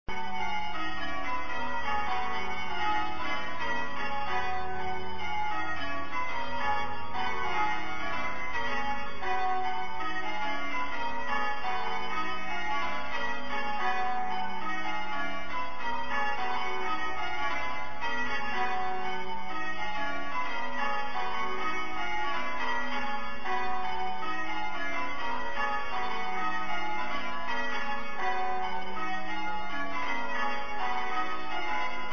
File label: Bells Of Hambleden